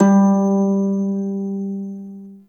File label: Prime Shawzin Oct One G; Warframe